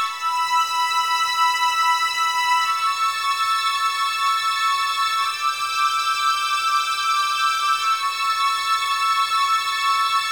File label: strings, analog funk